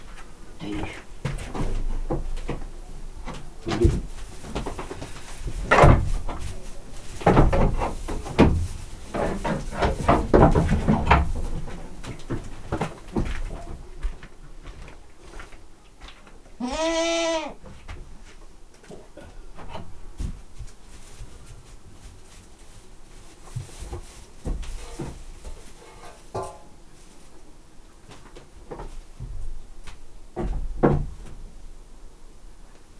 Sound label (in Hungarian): kecske ólban matatás; állathangok; paraszt udvar; házi kecske